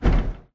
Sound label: bt footstep jog parts; Titanfall 2